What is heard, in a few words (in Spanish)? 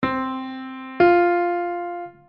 cuarta justa ascendente; intervalos; teoria musical; melodia